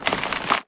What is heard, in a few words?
forest keeper eerie garden